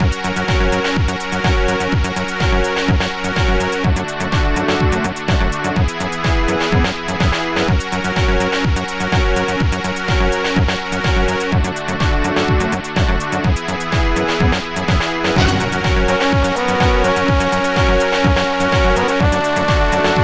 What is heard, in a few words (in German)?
musik; PowerBall